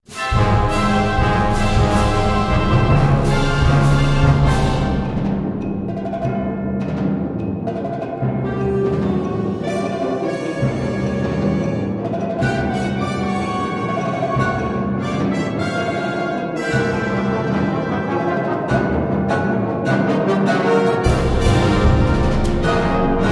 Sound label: Fanfare